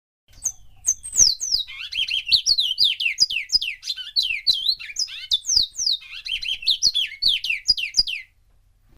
Twa Ring

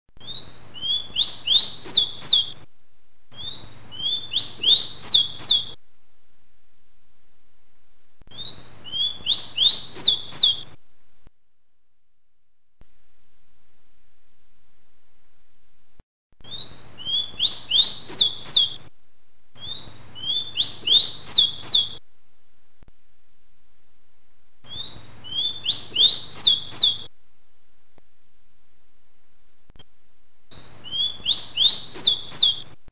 Rowtie Wiet Wiet

